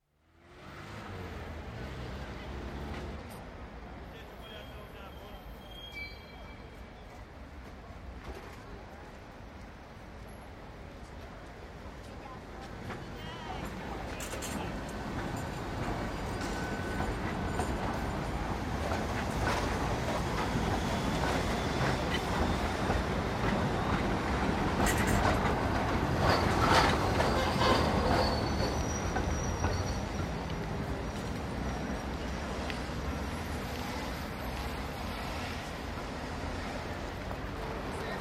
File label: Traffic, Moscva Ter, Budapest, with heavy traffic, including lots of trams, also footsteps and speech; Traffic And Car; BBC